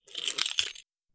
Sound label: Key sound effect